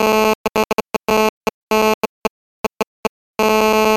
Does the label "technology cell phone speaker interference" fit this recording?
Yes